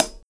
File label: Hi Hat Closed